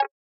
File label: game chat